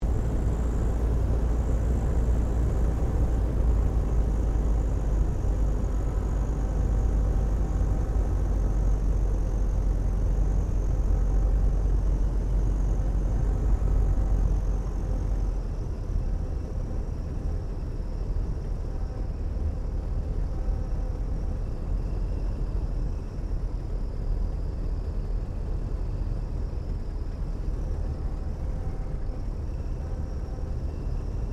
1916 Sopwith Pup replica touch n go interior, airplane; Airplanes; Vehicles